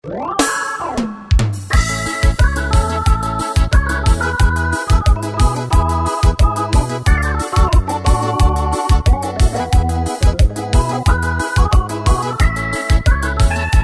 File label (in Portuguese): ritmos korg pa